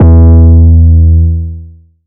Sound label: 808 Bass Happy E3; Slate 808 Deep Dive; SD Signature 808s